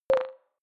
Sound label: click code room high, signale